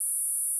Gauss Filt C4

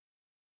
Gauss Filt E4

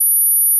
Gauss Filt F#6

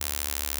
Inharmonicity D2

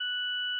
Gauss Filt F#6

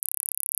Gauss Filt C#1

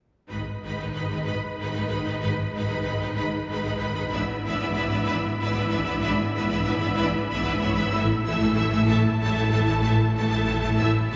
Bruckner Symph6 ORCH

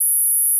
Gauss Filt F5

